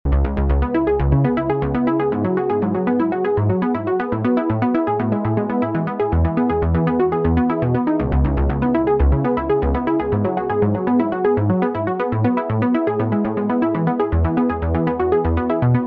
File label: lead arp dry; chiral audio